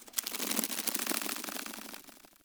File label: Ext Critter Scatter Clicky; Warframe; Infested Eris